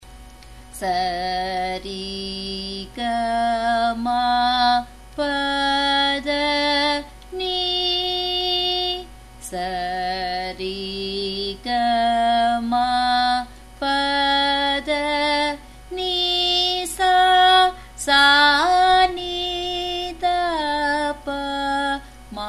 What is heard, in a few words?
Sarali Varise, F sharp